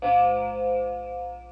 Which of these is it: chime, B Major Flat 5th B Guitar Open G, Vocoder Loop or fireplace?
chime